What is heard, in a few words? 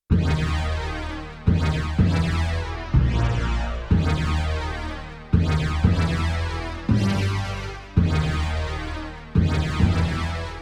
Orch Hit-Chorus; Yamaha TX16W; Orchestral Performance 1